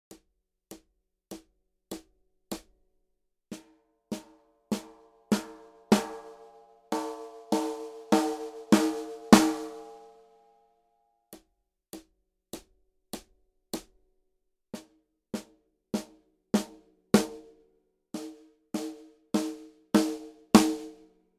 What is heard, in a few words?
Snare Drum - Birch Rutes